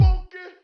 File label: BD funky, Electric Kick, Yamaha DTXpress; drum machine